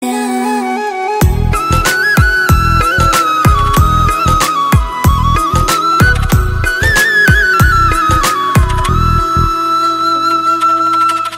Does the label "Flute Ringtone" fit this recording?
Yes